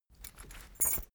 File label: key ring small trousers pocket put